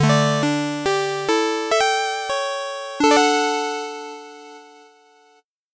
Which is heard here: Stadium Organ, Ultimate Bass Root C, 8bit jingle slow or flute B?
8bit jingle slow